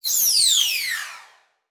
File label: Multimedia Internet CD-Rom Flash Blast